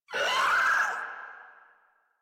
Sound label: Infested Crawler Pain; Warframe